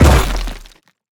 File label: invader weapon scifi explosion debris; Bluezone Corporation